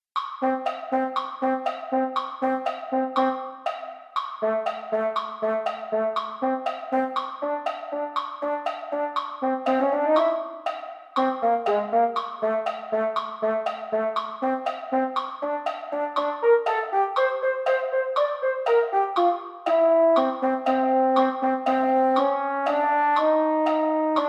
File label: mellophones; holiday brass